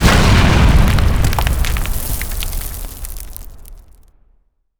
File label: rock explode; sirenhead tapes; knuckles tapes